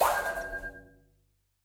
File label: Lab Giant robot Shot; Super Mombo Quest